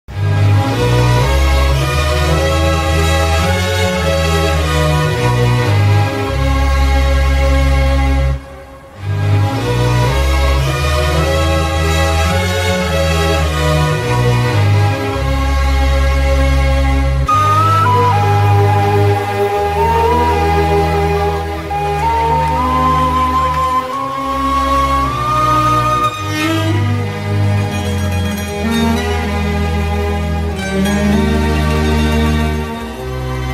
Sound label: mesmerizing love bgm; ghajini